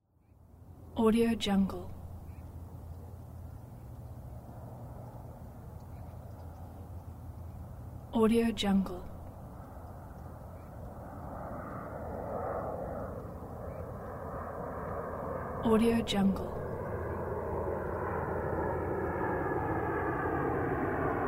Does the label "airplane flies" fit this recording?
Yes